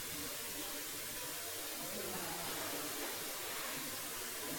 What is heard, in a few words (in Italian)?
vocalizzo femminile; Castello Marostica